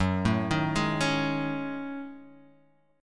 Gb Minor 6th Add 9th Gb down Guitar Half Step Down